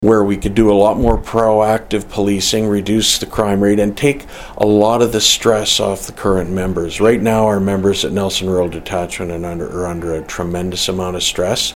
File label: Tom